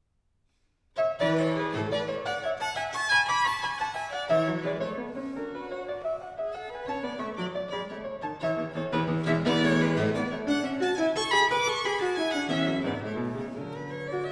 Sonata for violin & piano in A major, Molto allegro; Mozart